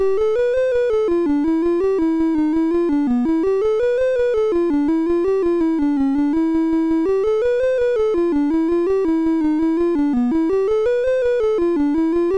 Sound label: UFO Strike, MOS6581R3